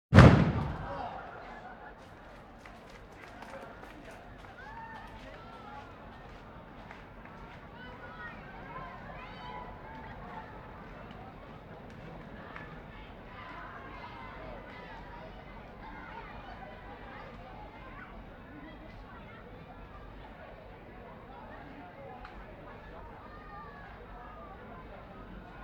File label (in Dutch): Zak Lopen; vrije tijd; KMP Film Video Sound Effects